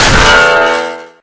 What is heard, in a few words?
melee guitar; weapons; source engine; left4dead2